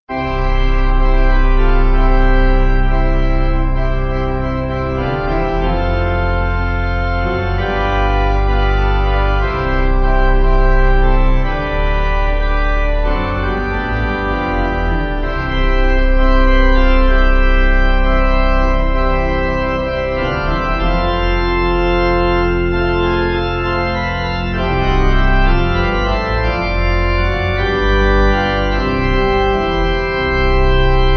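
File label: pipe organ hymn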